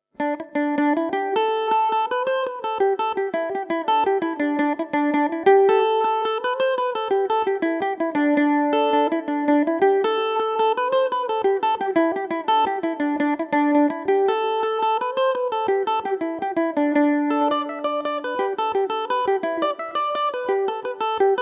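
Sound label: D mixolydian revelation, Irish mandolin; blarney pilgrim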